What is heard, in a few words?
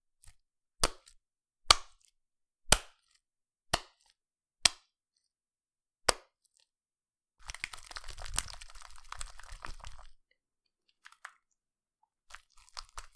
Slime Slapping